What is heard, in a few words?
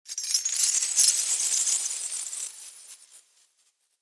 Sky Fortress Chain Move Trippy; Ode Sky Fortress; Tunnel